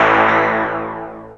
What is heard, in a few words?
SWIRL; X-Static Goldmine 1